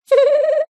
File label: cartoon character cute high pitched giggle laugh short